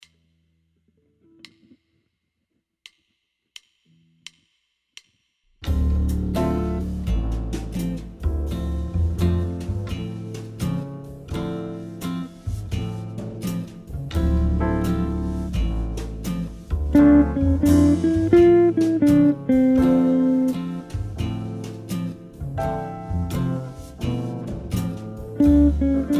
phrase en c, guitare jazz

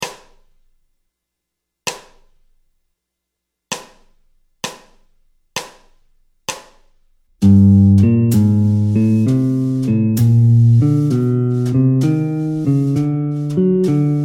Gamme bop asc G min harm, guitare jazz; boper ses gammes position 62

